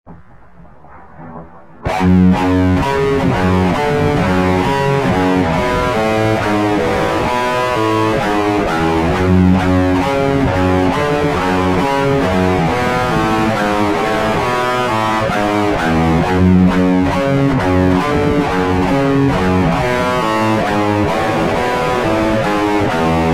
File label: Lead Intro